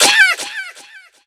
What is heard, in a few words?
Death; Elin; Kilta